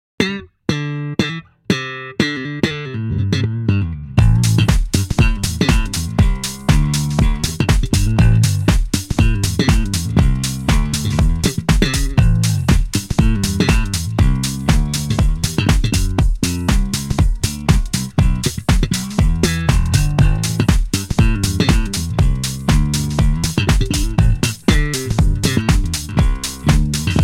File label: Funk